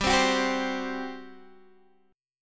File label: G Major 7th Suspended 4th, Guitar, Standard; GM7sus4; strummed; down; capo=3